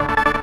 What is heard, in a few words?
synth